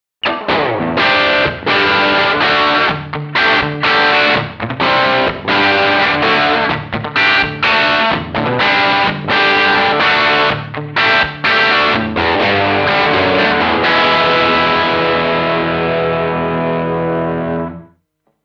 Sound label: straight6